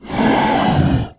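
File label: scream; The Elder Scrolls III - Morrowind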